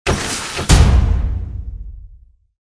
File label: Mecha dwarf Impact; Dwarves